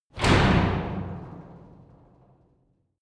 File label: garage door close short hard